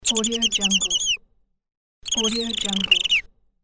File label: mouse squeak